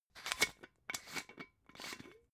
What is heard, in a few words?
glass bottle massive open scratch